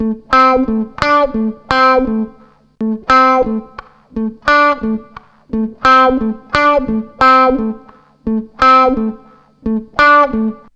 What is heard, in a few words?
GTR R; USB Soundscan vol.31; Miro s Grooves; AKAI